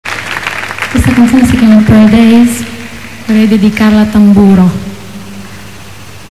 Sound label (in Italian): tamburo